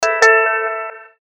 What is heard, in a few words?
Guitar, notifications; Tinno Cricket Ovation